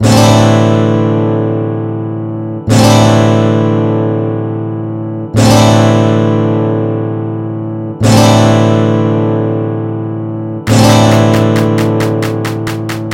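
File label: Flamenco, MIDI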